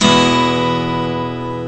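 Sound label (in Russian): гитара аккорд ми мажор emaj восходящий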